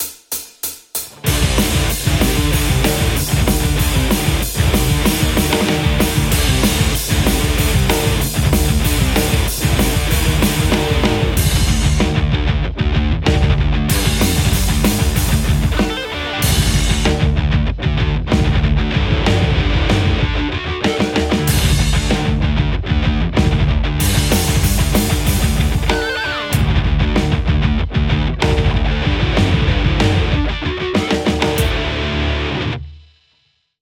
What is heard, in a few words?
Les Paul; metal; kuassa vermilion